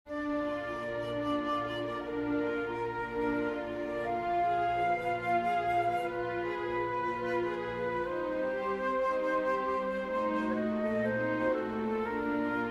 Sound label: for flute strings; Schubert, Franz Peter; die liebe